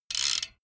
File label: bmx bike crank freewheel chopped short